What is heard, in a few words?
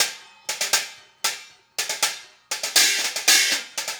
Hi Hat Pattern